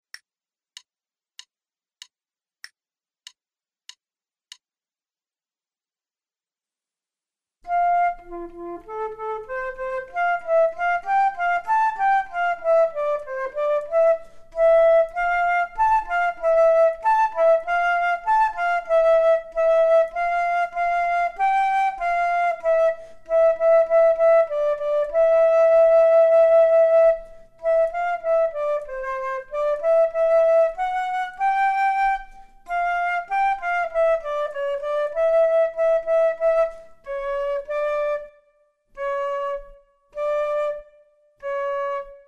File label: Duets flute; Selected Duets for Flute Podcast